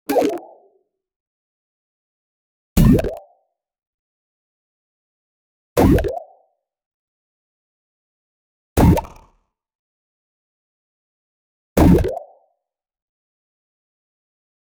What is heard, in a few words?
variety of different use, fps